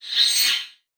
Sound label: Multimedia Internet CD-Rom Flash Whoosh